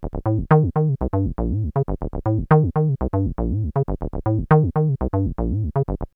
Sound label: 303 Loops; X-Static Goldmine